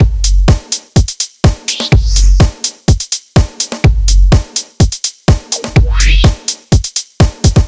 Ticking, electro

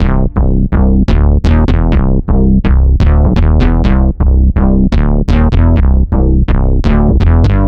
Bass

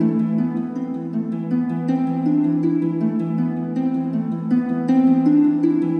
Harp

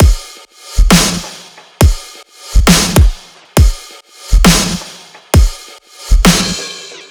Fragile Kit, 135 bpm

